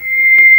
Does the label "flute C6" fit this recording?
Yes